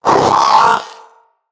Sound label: Companion Vocal Alert, Pets; Zanuka